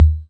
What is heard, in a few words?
Pearl SC-40 Kicks; DRUM MACHINES